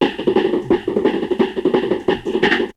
Drumloop; Drumloops; ZERO G - Datafile DISC 3

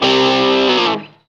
GTR Crunch, Dirty; Club-50 - Foundations Roland

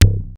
Bass Drum, Cwejman S1 MK2

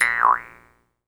Jaw Harps

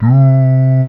Do C4, Humans; Humans1

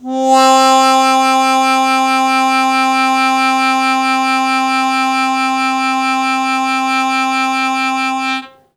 Long Sustain Fast Wah Wah C1, Harmonica; Zero G - Harmonica

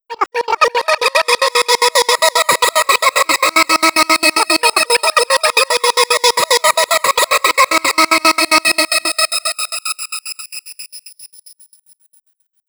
MONGOL V FX, Asian Traditions

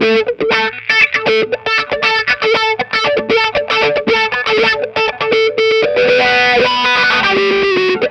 Wah Wah Gtr

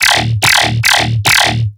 BASS SHOT D#

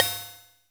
MSX RIDE, drum; Crypton - DrumBase5000; CR68; MSX KIT

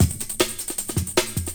RHYTHM, BREAK BEATS

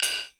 FX Sounds, Drum Machines E-MU Pro-Cussion

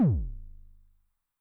Bass Drum Pitch Depth Overdrive, 909; classic machines; BD - BASS DRUM

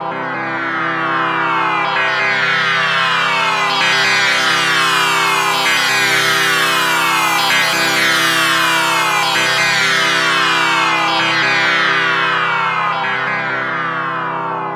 NRG Pad

